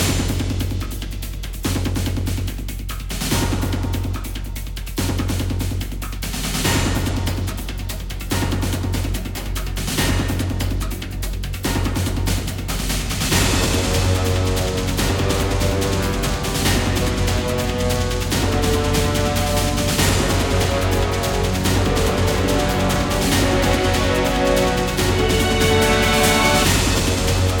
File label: Music Loop; Choplifter HD